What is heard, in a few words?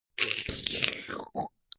Slurp, Slurping sound.; Human